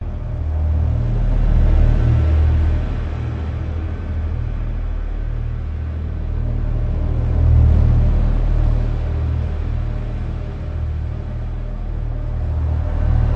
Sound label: sci-fi futuristic crab nebula; Sound Ex Machina